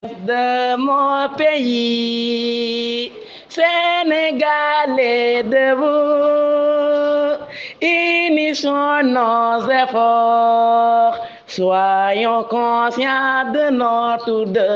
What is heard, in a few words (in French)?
Chant